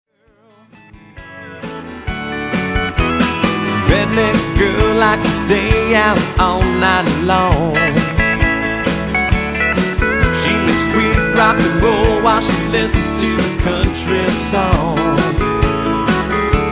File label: Country Rock